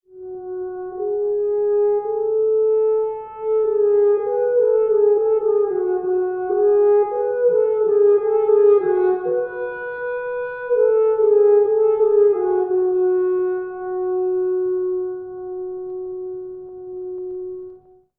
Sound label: muted sadness